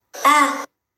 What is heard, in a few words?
Ahhh